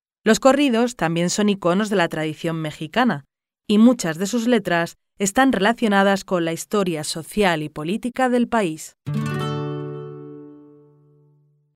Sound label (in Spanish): Mariachis Los corridos